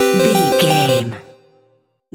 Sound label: collect item chime